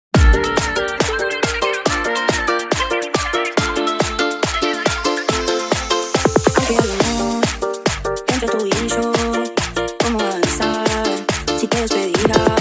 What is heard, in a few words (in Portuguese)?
reggaeton